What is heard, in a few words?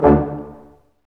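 BRS Large Ensemble stac; Roland L-CD702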